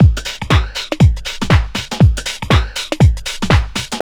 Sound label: house loop